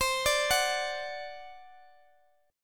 C Suspended 2nd Flat 5th C down Guitar Standard; Csus2b5 arpeggiated

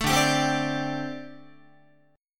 D 7th Suspended 4th, down, Guitar; D7sus4; strummed; 12,10,10,10,11,13

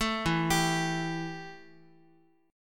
E Minor Double Flat 5th E, Guitar; arpeggiated; JGuitar

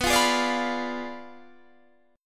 B Diminished 7th B down Guitar Standard; strummed; JGuitar

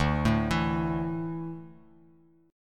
D Suspended 2nd D down Bass Standard, chord; arpeggiated

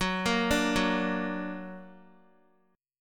Gb Augmented, down, Guitar; Gb+ arpeggiated

